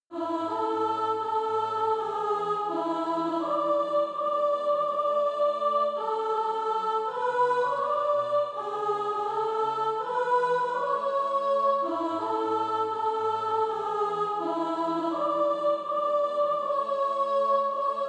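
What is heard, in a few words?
soprano, Practise Music; SATB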